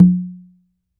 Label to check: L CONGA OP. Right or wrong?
Right